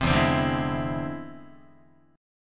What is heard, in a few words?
E Augmented 7th E down Guitar; E+7 strummed; JGuitar